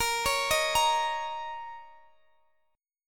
Bb Minor Double Flat 5th Bb, Guitar, Open D; x,x,20,19,18,20

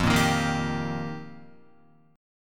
F 7th Suspended 2nd Suspended 4th, Guitar; F7sus2sus4; strummed; JGuitar

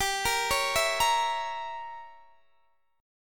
G Diminished 7th, Guitar, Open D

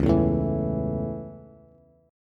C sharp Minor Sharp 5th, Bass; strummed; chord; 9,7,7,9; JGuitar

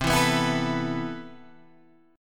C Major 13th, Guitar Open G, chord

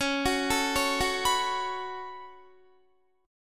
Db 7th Suspended 4th Sharp 5th Db down Guitar; Db7sus4#5 arpeggiated; tuning=4E,4A,5D,5G,5C,6F

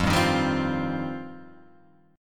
F 7th Suspended 2nd F down Guitar Open G

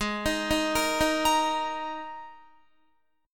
Ab Suspended 2nd Guitar; arpeggiated; Absus2; 15,18,15,15,15,18

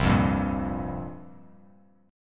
C 7th Suspended 4th, Bass Standard; C7sus4 strummed

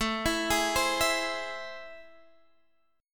A Minor Major 7th, down, Guitar, Half Step Down

